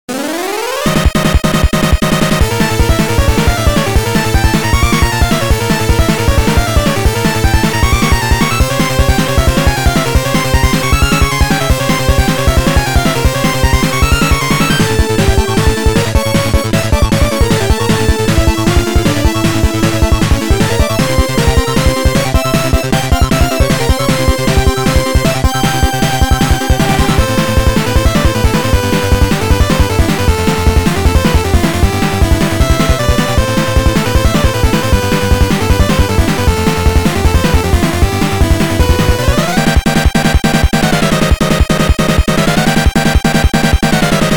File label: 8bit Battle